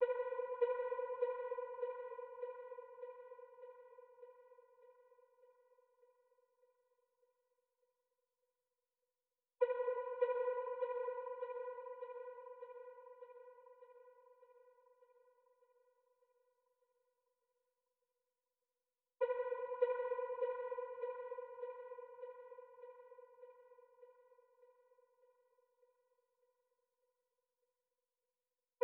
100 BPM E Min Accent, Afrobeats; Melodies; Cymatics; GHOST (Beta Pack); Alora

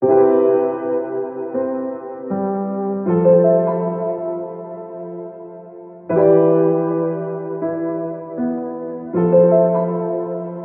79 BPM C Maj Piano; Cymatics; Gems Free Teaser Pack; Cymatics Gems Vol 2; Memories

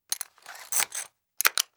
Seat Belt Loud Clicks Pull

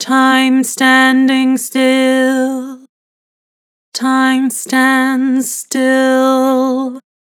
Vibrato, Vocals; Harmor Vocals

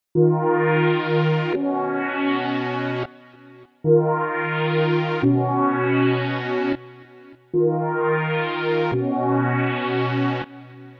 130 BPM D# Min Pad, loop stems; Melody; Cymatics; 2022 Melody Collection - Wavy; Run Dat

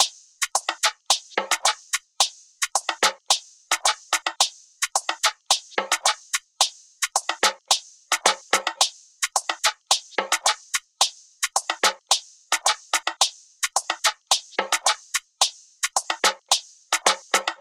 Dance Percussion Loop 109 BPM; Hip Hop

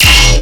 Bass Hit D#, Dubstep; Abyssal Rumble; Ghosthack; Demolition

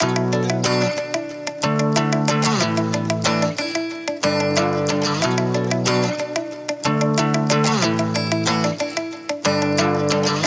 184 BPM B Min, Guitar; Sunset; Cymatics; ZODIAC Beta Pack

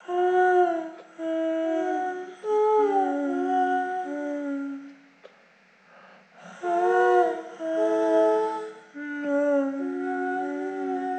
Vocal - 75 BPM B Min; Cymatics; Orchid Sample Pack; Vocal Loops

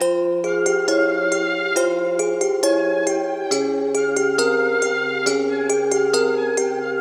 Dark Melody Loop - 137 BPM F Min; Cymatics; Oracle Sample Pack

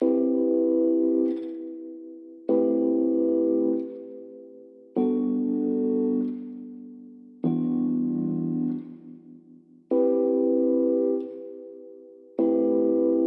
97 BPM E Min Vibra; Melodies; Loop Stems; Cymatics; 2022 Melody Mystery Expansion; Sleepless Night

